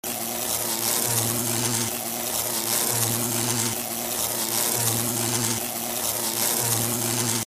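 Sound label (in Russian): osa zvuk, wasp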